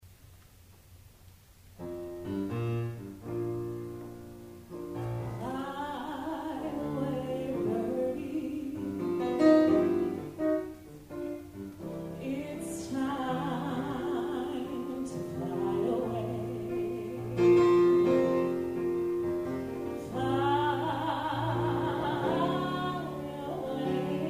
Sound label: Jazz; Jazz at FCC; Jazz Extension